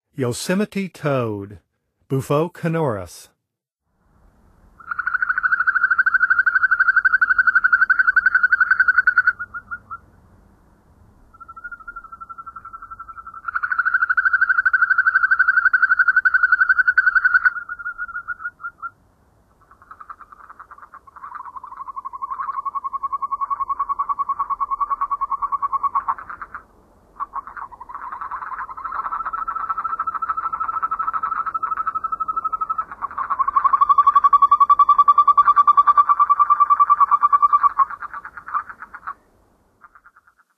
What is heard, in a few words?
Yosemite Toad; North America; Songs of Frogs & Toads of North America